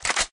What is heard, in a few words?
reload, guns magin